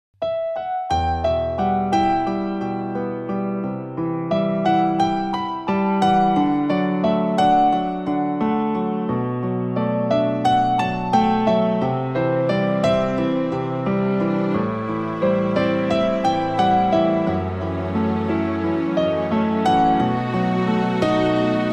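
Instrumental melody classic - Simply beautiful melody; Instrumentalnaya melodiya klasik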